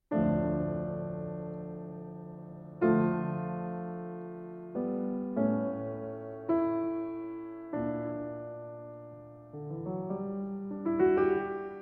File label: Piano Sonata in D Major, Largo e Sostenuto